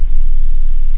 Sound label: sub base, bass, synth; da capo vol1